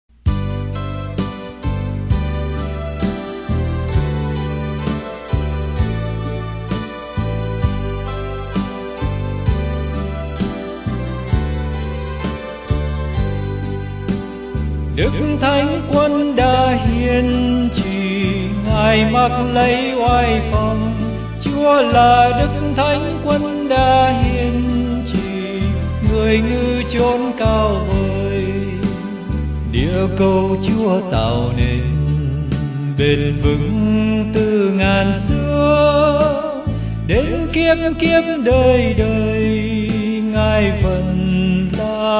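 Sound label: soprano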